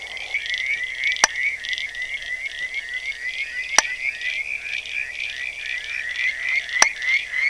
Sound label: Limnodynastes tasmaniensis